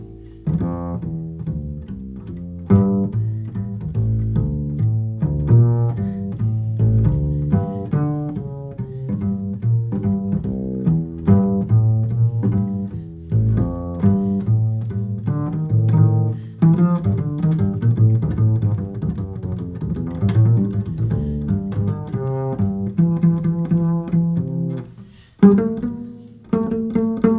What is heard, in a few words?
DPA 4099